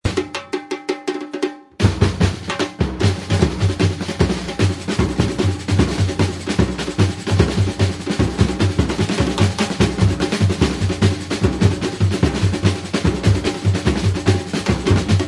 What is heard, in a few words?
Samba Quick, קטע סמבה / מהירות גבוהה; Batucada